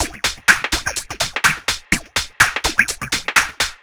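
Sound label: No bass drum, Drum Loops 125bpm, Nu Dance Electro; Blip Jam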